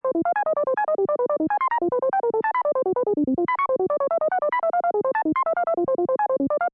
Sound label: 60S Computer, Retro sounds of a computer of 50's and 60's movies, or other retro sci-fi; Retro sounds of a computer of 50's and 60's movies, or other retro sci-fi machine, continuous bleeps, loop.